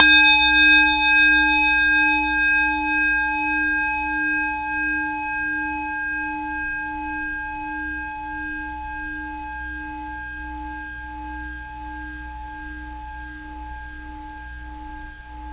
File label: BELL